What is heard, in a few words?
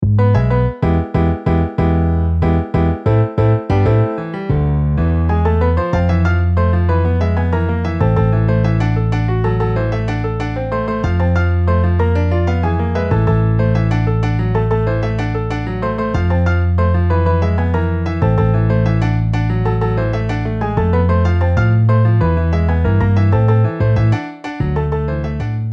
Piano Bass MIDI